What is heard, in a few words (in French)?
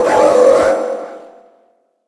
Cri Méga